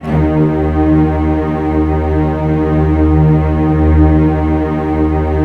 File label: DOUBLEBASS; ILIO; Kirk Hunter Virtuoso Strings VOL-2; 90 s Sample CDs; 5DOUBLEBASS